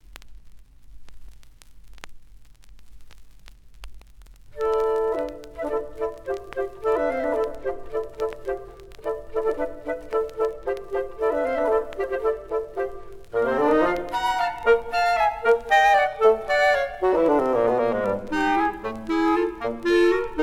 Sonatinetta For Flute, Clarinet & Bassoon, Allegrett; Allegretto Commodo